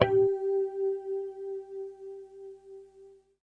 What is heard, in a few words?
harmonics, Guitars